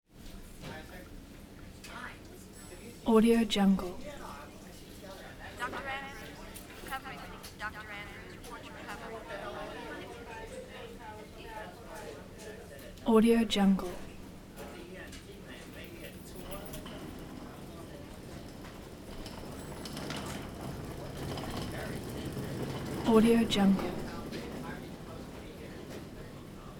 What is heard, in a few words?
hospital ambience general walla